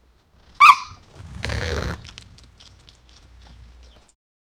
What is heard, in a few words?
large cerberus dog large, bite